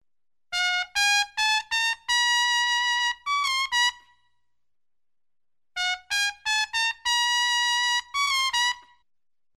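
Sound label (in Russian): труба джазовая мелодия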